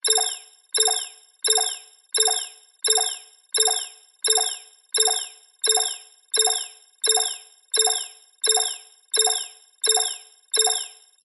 science fiction computer console alarm beep warning countdown